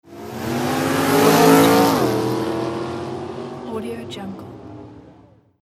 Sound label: racing car